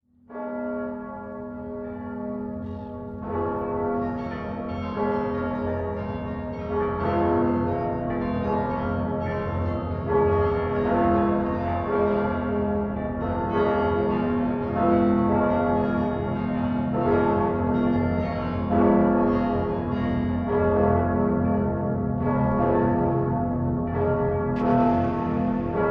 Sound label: creepy church bells sound effect